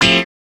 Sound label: chords